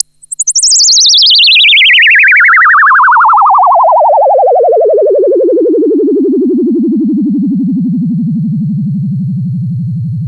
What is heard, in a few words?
SYNTH FX